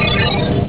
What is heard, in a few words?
BEEP